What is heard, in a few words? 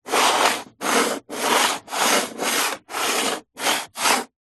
technology computer mouse old plastic slides movements wood table